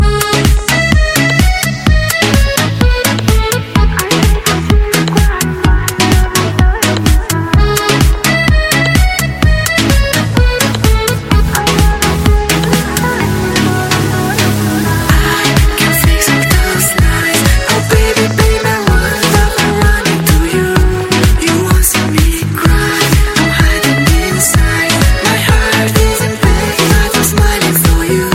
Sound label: Love Instrumental